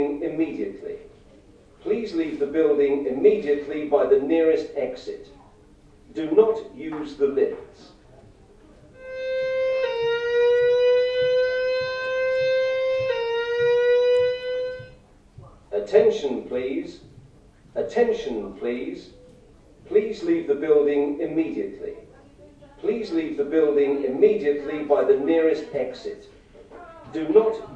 the fire alarm test

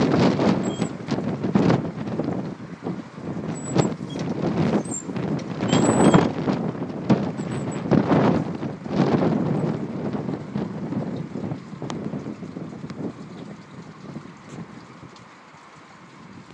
pub sign squeaking in the wind

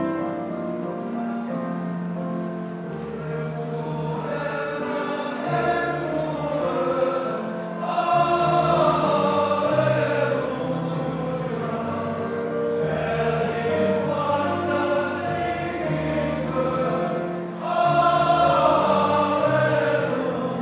male voice choir; welsh celebration; cymru wales senedd

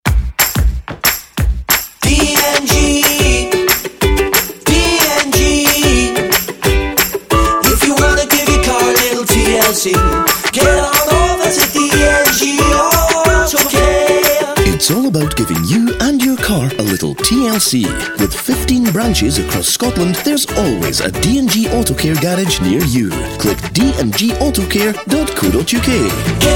new jingle; g-autocare

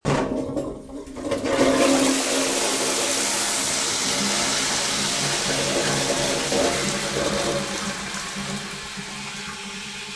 flushed away

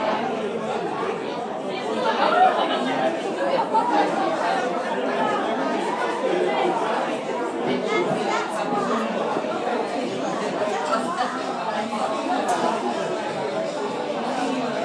the sound of an empty bar

